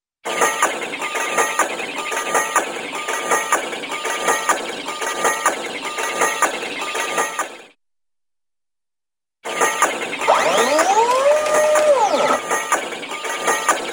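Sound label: Machine Runs Steady with ringing Metallic Clanks; Hollywood Edge Cartoon Trax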